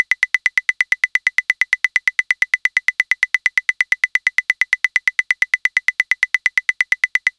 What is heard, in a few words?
Drum Loop Perc 130